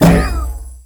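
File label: Launch Shroom Projectile Bounce; Warframe; Nokko; Launch Mushroom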